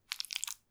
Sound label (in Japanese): 浅めにストローク, ピストン運動 激しめ; １ストローク単発